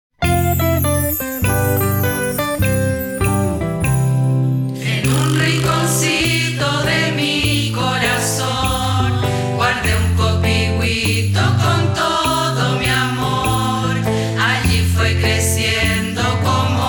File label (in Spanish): Himno con Voces; Copihuito